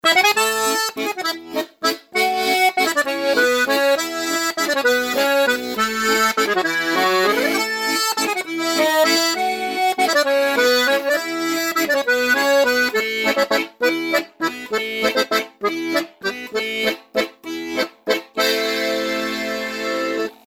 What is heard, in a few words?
accordion